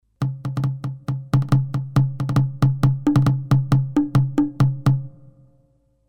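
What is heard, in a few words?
Mooer Synth Drum Patch Cajon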